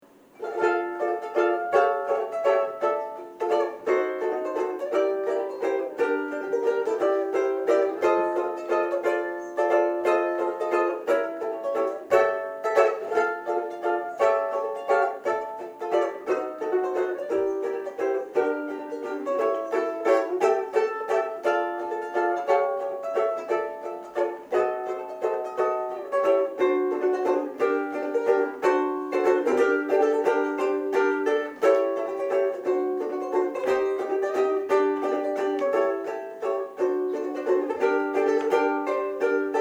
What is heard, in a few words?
Ukulele